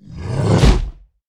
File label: boss mono attack